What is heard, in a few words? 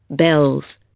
BELLS